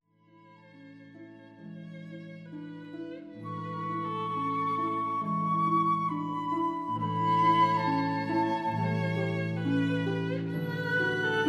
arpa flauto violino; Franck Panis angelicus

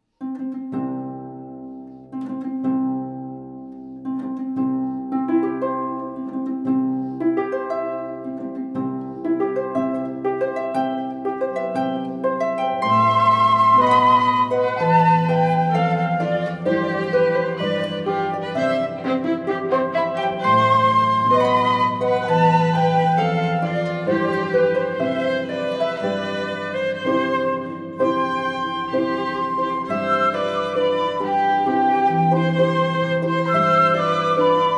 arpa flauto violino; Mendelssohn; Marcia nuziale; matrimoni

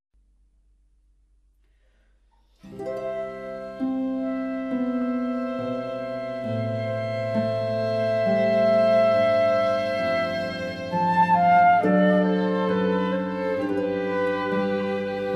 arpa flauto violino; JS Bach Aria sulla IV corda

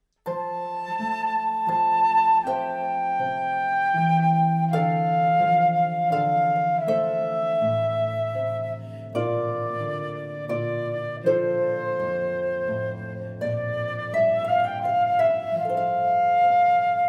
arpa flauto; H Purcell; Ground in F; matrimoni

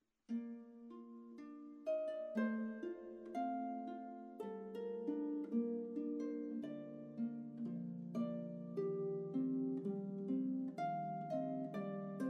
arpa; Romanza; Krumpholz; matrimoni

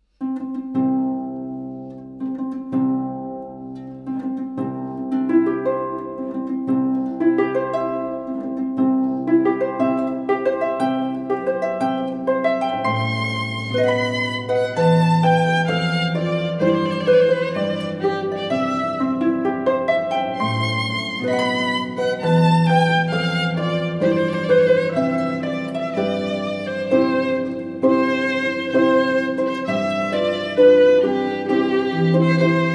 Marcia nuziale arpa violino; Mendelssohn

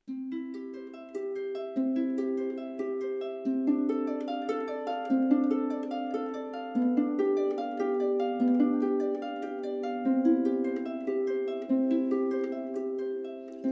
arpa flauto; Ave Maria; Gounod; matrimoni

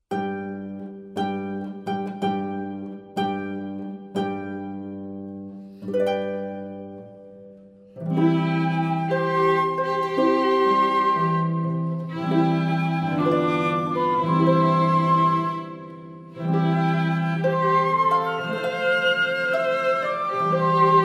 Marcia nuziale arpa flauto violino; Wagner; Marcia nuziale dal Lohengrin

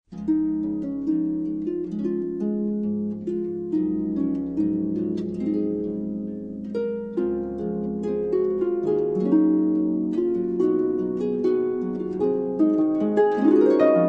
arpa; matrimoni

